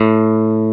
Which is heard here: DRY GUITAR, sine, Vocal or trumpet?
DRY GUITAR